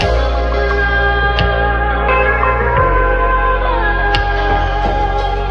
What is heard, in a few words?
keys, loops, phonky